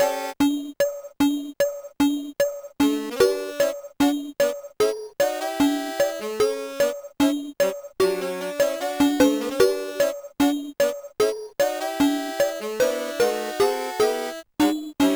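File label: organ; Deluxe Music Construction Set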